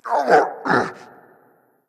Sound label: hitreact human male small